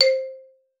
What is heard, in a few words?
C4, xylophone; Xsample VOL-4